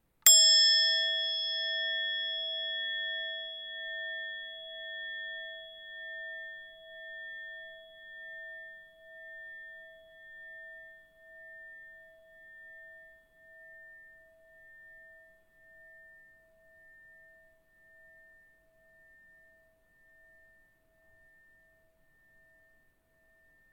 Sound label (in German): anschlag metallkugel; Klangschalen zu den Klangschalenuhren; Dharma 88mm mit Metallkugel angeschlagen